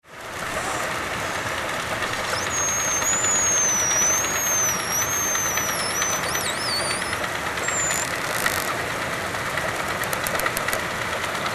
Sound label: Orca Calf; Vashon